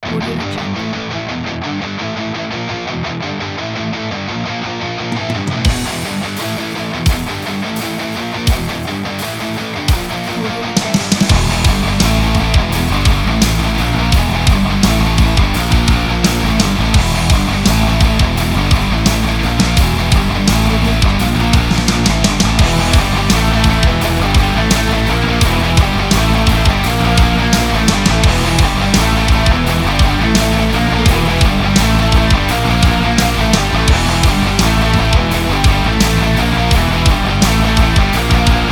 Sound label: hard and heavy; theo sound